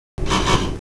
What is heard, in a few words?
leave, Train cl158; OpenBVE